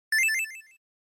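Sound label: Game Boy General Pitch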